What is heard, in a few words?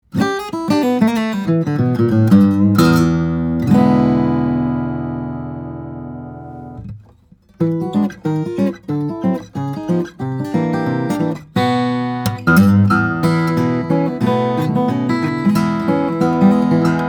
Froggy Bottom M Deluxe, steel-string flattop guitars; indian rosewood; adirondack spruce